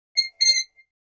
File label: Metal Scrape On Metal